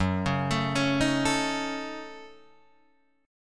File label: Gb Minor 6th Gb Guitar Drop D